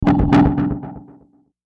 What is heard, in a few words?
Warble Stutter Pulse Pan Delay; Blastwave